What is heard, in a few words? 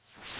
noise fx; Masterbits; Switch FX